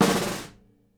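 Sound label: SNARE ROLL, Live Snares; PlatinumPhattCD1; E-MU Producer Series Vol. 8 – Platinum Phatt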